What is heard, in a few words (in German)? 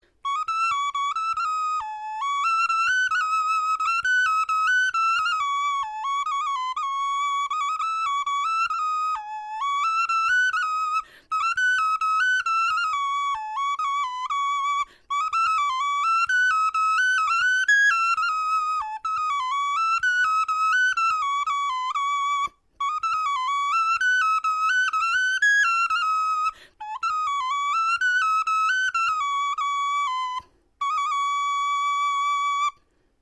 melodie